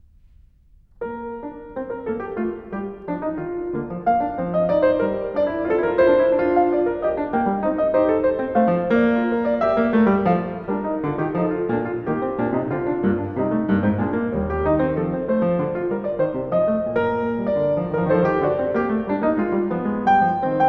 Zweistimmige Inventionen B-Dur, klavier; Zweistimmige Inventionen XIV) B-Dur; BWV 800; BACH; Inventions

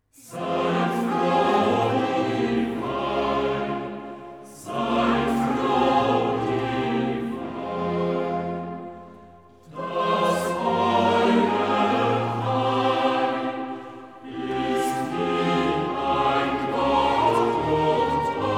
Choral Seid froh dieweil; BACH; Christmas Oratorio

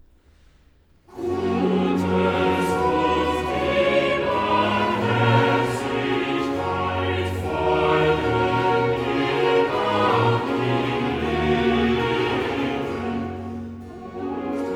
Choral; Bach; Cantatas; Der Herr ist mein getreuer Hirt; Gutes und die Barmherzigkeit

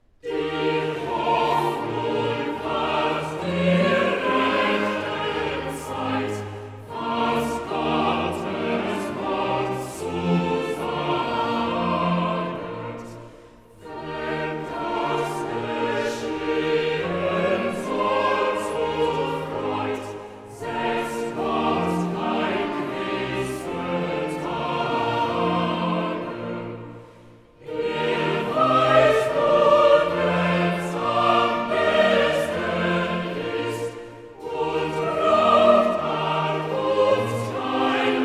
Choral; Die Hoffnung wart; BACH VOCAL; CANTATAS; BWV 86; Wahrlich, wahrlich, ich sage euch

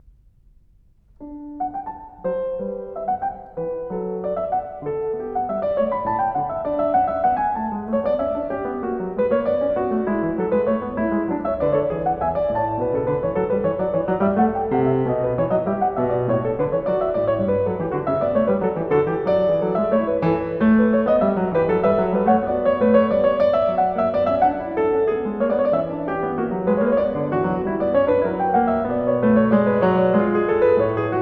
Zweistimmige Inventionen D-Dur; Zweistimmige Inventionen III) D-Dur; BWV 789; BACH; Inventions

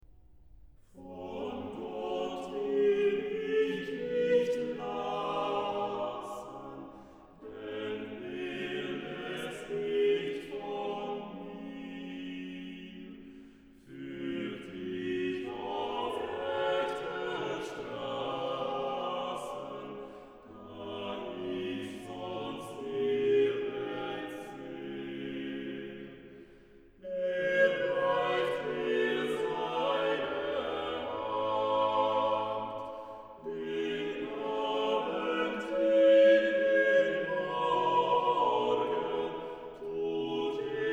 Orgel; BWV0418; Von Gott will ich nicht lassen; BACH; BACH ORGAN WORKS; CHORALE PRELUDES

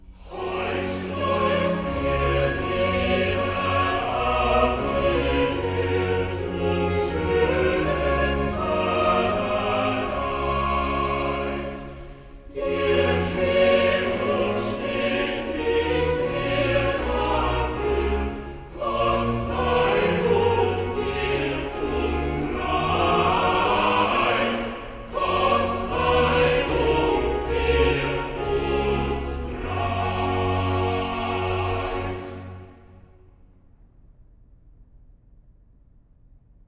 Choral; BACH VOCAL; CANTATAS; BWV 151; SьЯer Trost, mein Jesus kцmmt; Heut schleußt er wieder auf die Tür

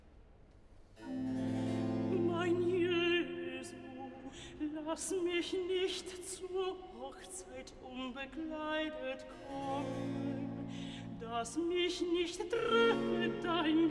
Recitativo (Alt), Bach for alto; Ach! ich sehe itzt da ich zur Hochzeit gehe; Mein Jesu lass mich nicht

